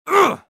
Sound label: grunt